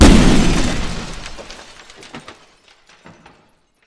glass break; Barotrauma; Subsurface

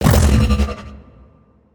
observer emp; Barotrauma; Barotraumatic

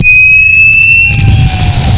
sonar Ping Water Close; Barotrauma; Real Sonar

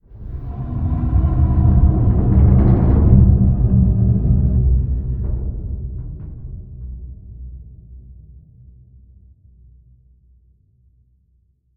Attack; Barotrauma; Barotraumatic; Carrierleviathan

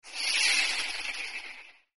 dark magic snake; Barotrauma; Barotraumatic; Seasnek

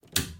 switch; Barotrauma; EK modern

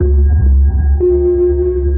sonar Ping Suit Far Shuttle; Barotrauma; Real Sonar

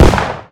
heavy ammo explode; Barotrauma; barotrauma ek

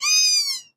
Squeak; DynamicEuropa; Impact; Noveva

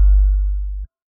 sonar Tail Suit Close Beacon; Barotrauma; Real Sonar

